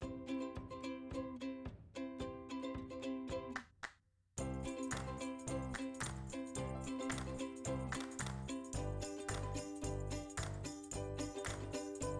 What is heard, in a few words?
music cheerful; bgm